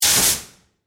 Pneumatic Air Burst, Scifi, Pneumatic, Air, Burst, Science, Fiction; Spacecrafts